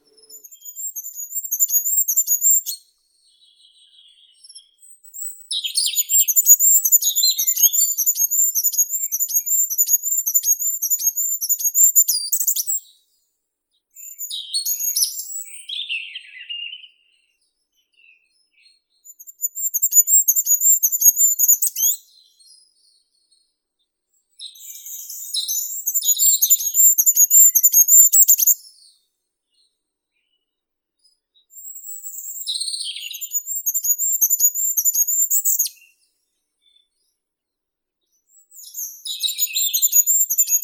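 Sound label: goldcrest, regulus regulus; korolek zheltogolovii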